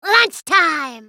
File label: ultimate effort; Fizz